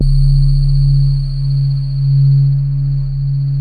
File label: PAD pad; Best Service Gigapack 1